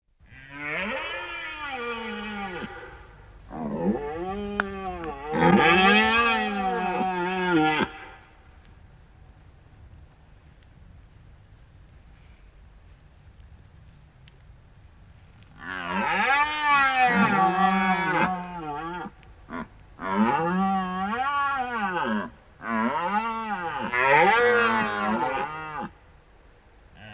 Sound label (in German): Hirschbrunft